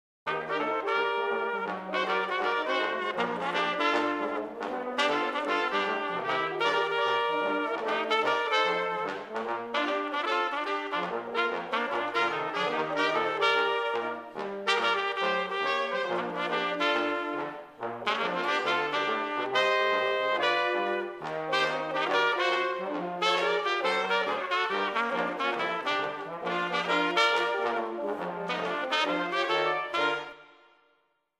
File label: Brass Quintet; Premier